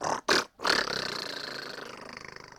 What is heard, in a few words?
gator med howl